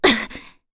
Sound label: Damage, Vox; FreyB; kings raid; en